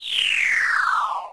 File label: planetary defense run; Sid Meier's Civilization 4 Complete; Beyond the Sword; Final Frontier; Units